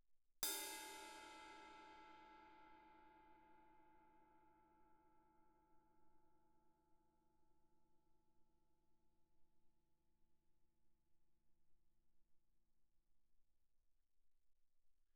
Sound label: crash stick normal mf, suspended cymbals; Percussion